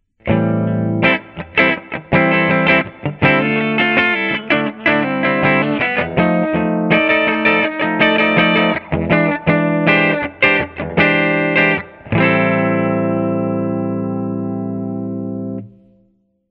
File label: clean both neck parallel bridge series, clean – both pickups with neck-PU in parallel; Manne Ventura